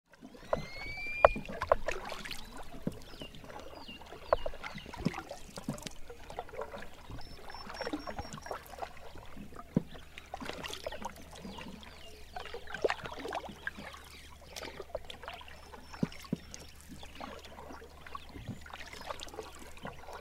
mangrove swamp recording Sian Kaan Yucatan Peninsula